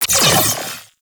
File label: Riot Moa Tether Fire Zap; Warframe; Attacks